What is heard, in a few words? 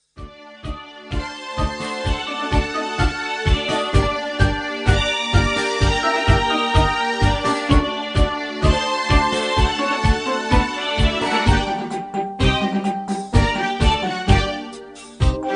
Tango Argentino